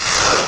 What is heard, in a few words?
gas; Odyssey Demo